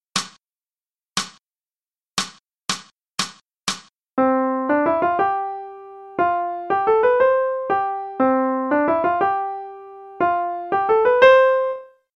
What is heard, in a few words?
Transcribing Rhythms; non-swinging